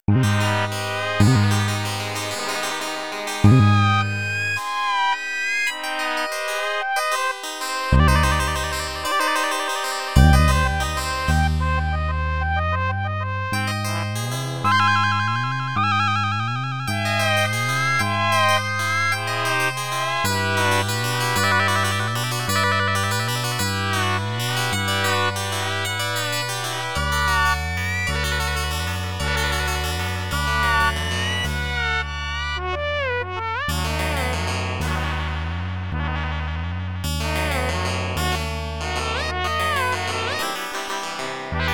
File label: drum